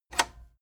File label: cassette eject release